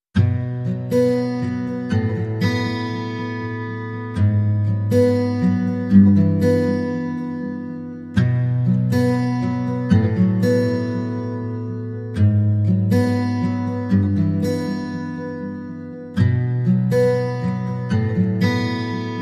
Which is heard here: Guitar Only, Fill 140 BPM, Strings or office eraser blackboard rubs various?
Guitar Only